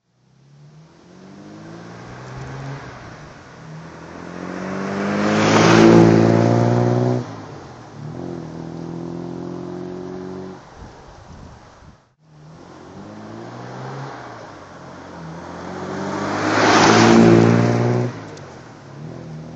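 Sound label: jaguar mk2 manual driveby, engine sound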